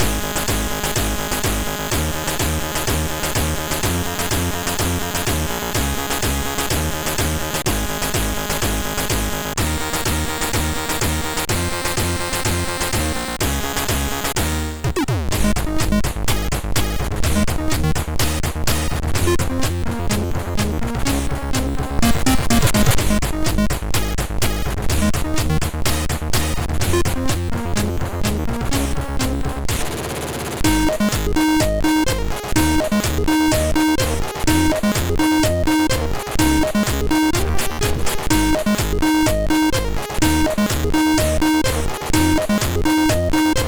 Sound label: MOS6581; MOS6581R3